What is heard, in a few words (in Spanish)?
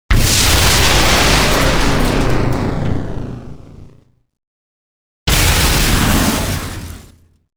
EXPLOSION grande